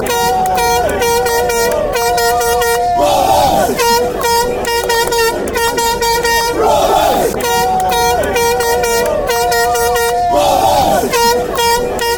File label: clap, fanchants; rovers; brfc